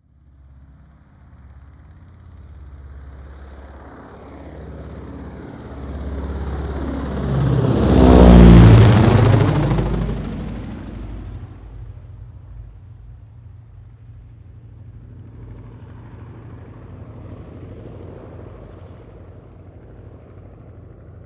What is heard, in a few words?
propeller plane ju52 fly by overhead long leaving